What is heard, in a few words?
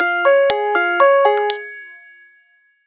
MELODY